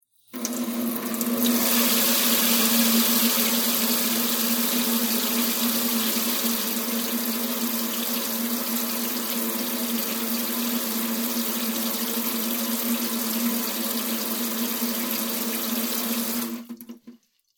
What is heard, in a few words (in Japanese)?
蛇口から水が出る音